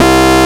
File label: SYNC WAH, Jupiter Synths; E-MU Formula 4000 Series Vol. 3 – Analog Odyssey